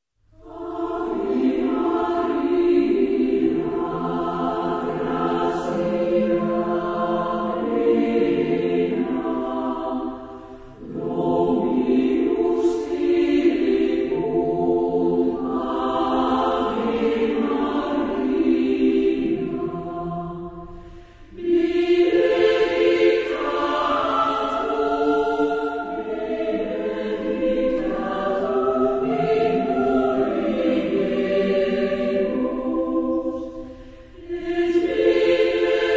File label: Women's Choir, Ave Maria 16th century setting